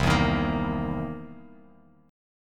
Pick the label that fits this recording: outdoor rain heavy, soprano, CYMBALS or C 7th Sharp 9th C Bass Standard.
C 7th Sharp 9th C Bass Standard